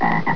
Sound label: BULFROG